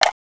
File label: sniper mag lock, weapons; svencoop